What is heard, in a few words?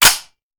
Machine Gun Foley; Sound Ideas; Guns